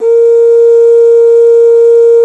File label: CONCH HIGH, World Percussion